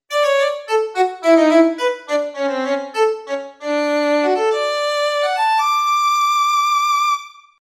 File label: violin; moss